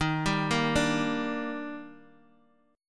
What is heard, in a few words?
D sharp Major 7th, Guitar Open D